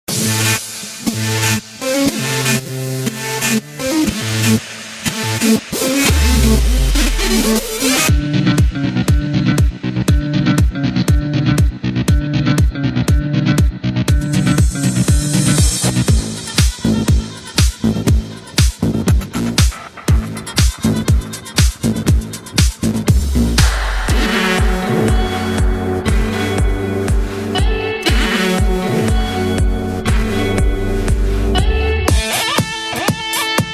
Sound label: future house guitars